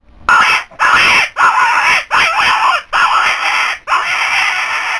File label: raspy